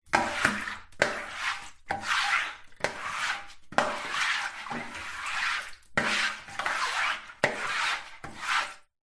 household bathroom shower squeegee scrape shower tiled floor